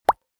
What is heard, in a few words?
Text pop up